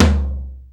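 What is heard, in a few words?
16in power floor tom; hr16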